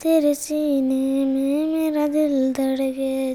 CHILD, Indian Traditions

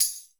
Hard Tamb, Alesis D4

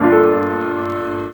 Acoustic Piano; DRUNK DOG

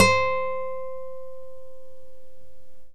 NYLON GTR

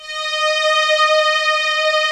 COMBO STRINGS; OMI - Universe of Sounds

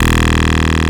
PPG 2.3; DIGITAL1 HYB

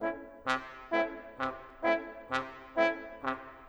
Horn Trombone, Orchestral; Sonic Foundry (Sony Creative Software); Orchestral VOL-2

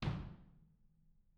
R B Kick Room, drums, One Shots; Sonic Foundry; Sony Creative Software; Drums from the Big Room The Mixes; R B Kicks

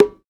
Congas; African Contemporary; Spectrasonics; Supreme Beats

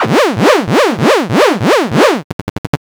Credit Alert; Zero-G; Soundclash Nu Dance Electro; Acidized Wavs; FX Atmospheres

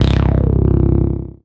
Single Electric Basses, Dance Floor Bass

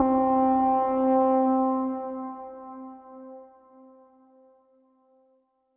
Synth Prophet One Shots, Keys C; Electronisounds

